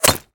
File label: bullet impact concrete brick; Gamemaster Audio; Bullet Impact Sounds